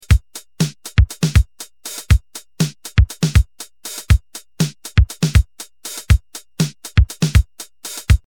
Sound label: tr hard BD